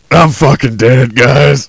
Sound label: Die; USEC